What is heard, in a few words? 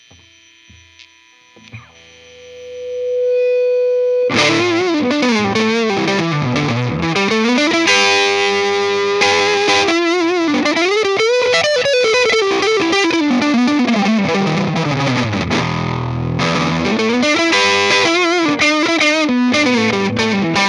rock